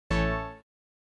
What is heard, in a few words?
OS Sound Notification